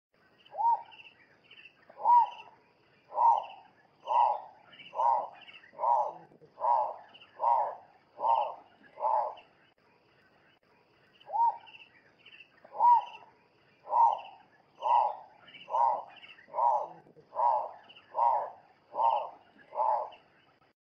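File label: turako schalowi, Schalow's turaco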